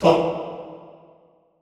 TONG E2, Extended Classical Choir; Best Service; SHORT TONG

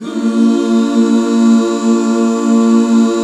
BB MAJ, Back Chords; Sound & Vision - Gigapack II CD 1

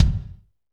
KIK, Drumscapes Roland, Pwr Ballad; Northstar - Drumscapes Roland

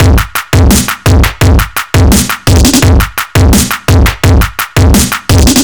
Beat Fuzz 85, distorted drum loops 85bpm; musicradar

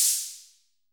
NORD CYM1; Drums In Your FACE!

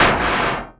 PUSH, Anime Sound FX; AKAI Anime Sound FX

